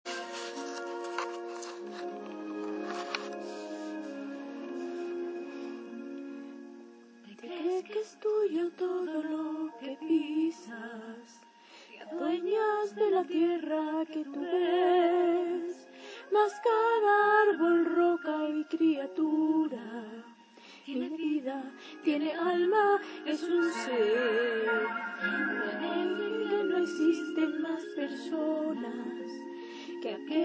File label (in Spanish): fragmento cantado